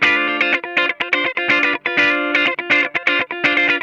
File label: D PEDAL, Funk Guitar; 90 s Sample CDs; Zero G